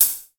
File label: crunchy hihat sample f key